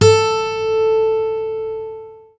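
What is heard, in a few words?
GUI Ac. A2, acoustic guitar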